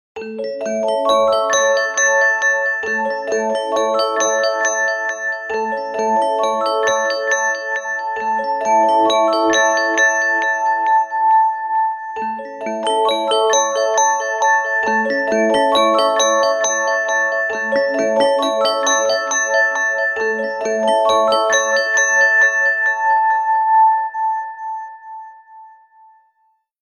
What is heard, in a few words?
Arpeggio, ringtones; Sony Ericsson; W995